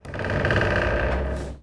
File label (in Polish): skrzypienie; Szkola Koziolka; CyberMycha 2003 October